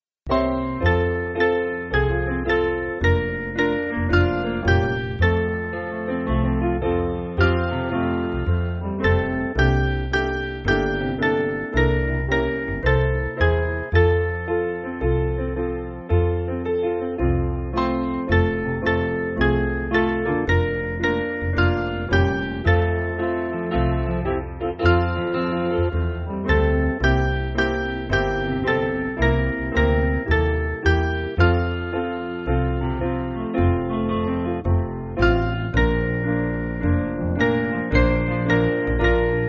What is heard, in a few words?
Piano